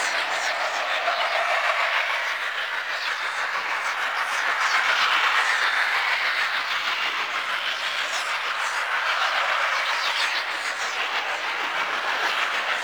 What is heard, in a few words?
Ship Void Portal Hand Idle Amb; Gameplay; Ambience; Warframe; Corpus Ship Remaster